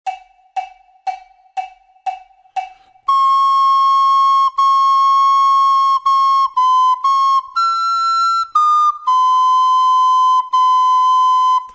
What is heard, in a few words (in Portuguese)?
DUETO FLAUTA; GERMAN WALTZ